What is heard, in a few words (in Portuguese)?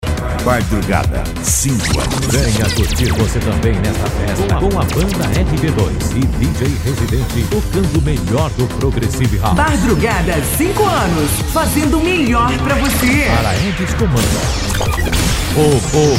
sertanejo remix e electro dance